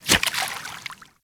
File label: step water, Kubrow, Footsteps; Warframe